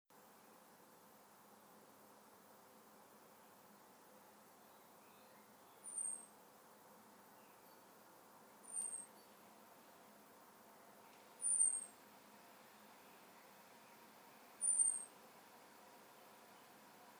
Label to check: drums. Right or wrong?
Wrong